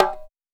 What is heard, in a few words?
Bongo Edge Low; One Shots; Drums; Ableton Live 12 Lite; Core Library